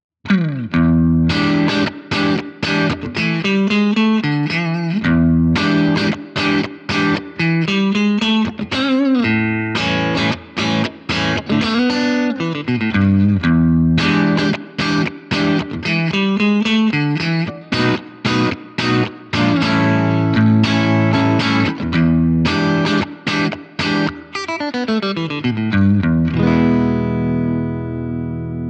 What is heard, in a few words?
breakup; jensentone